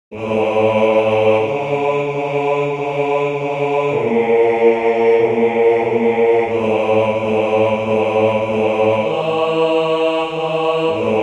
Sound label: Bass